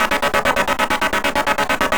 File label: Pulse Seq D 120, Trance Sequences; Trance Formation